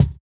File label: Kick, jungle breaks drum sounds; ORIGINAL JUNGLE BREAKS; JBK KICKS